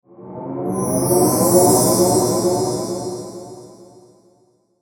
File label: SHIMMER WARP, Shimmer Warp Flange; Warped